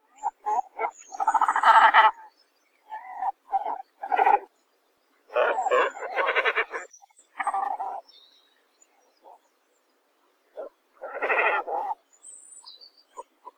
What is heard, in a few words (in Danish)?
Skarv Phalacrocorax carbo sinensis